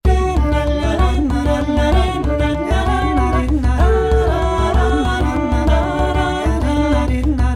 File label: Tradition irish Voice; Adventure Xpress